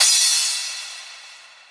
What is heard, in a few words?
Crash